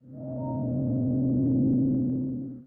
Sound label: bass resyn